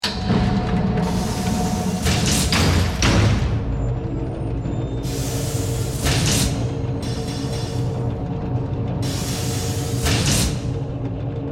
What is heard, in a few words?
Factory Mechanical, Assembly, Science Fiction; Spacecraft